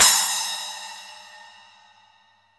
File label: SPLASH, Drum; East Collexion; Drum 1 Dry